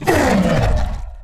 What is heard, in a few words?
hurt, creatures; combat; ryuchi; CosmicRageSounds